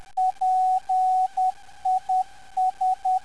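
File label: 424kHz, non-directional beacon; PIS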